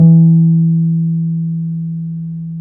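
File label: piano Wurly; Keys of the 60s and 70s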